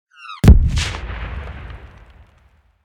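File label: Explosion And Shrapnel Fly By; Schrapnel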